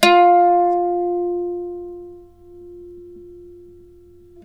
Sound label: f+3, Mandolin; Terkelsen